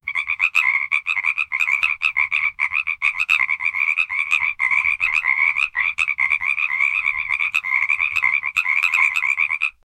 grupo de muchas ranas cro, croaking

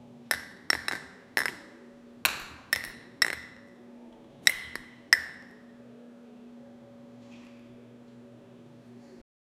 cortina de terciopelo; interior

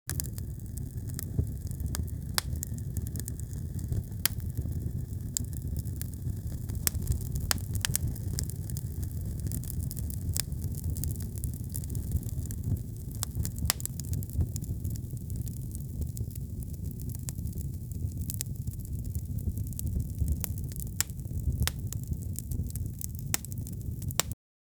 sonido de fuego caliente; burning

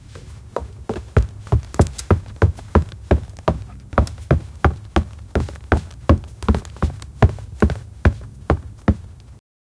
sonidos de pasos de botas; sound effect

